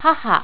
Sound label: haha